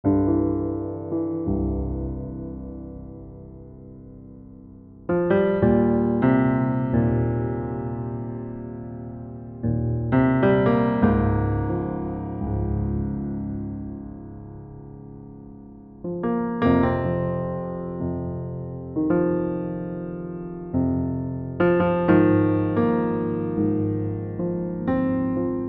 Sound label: bhoutan piano